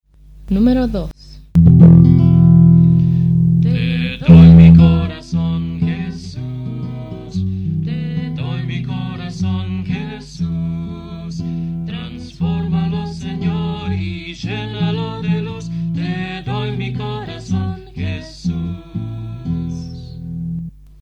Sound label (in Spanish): Coro, himnario niños